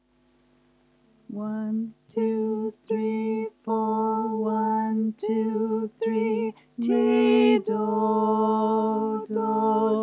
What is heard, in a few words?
Auld high and bass solfa chorus, Folk Choir; Auld Lang Syne Resources